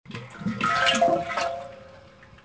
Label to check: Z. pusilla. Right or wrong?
Wrong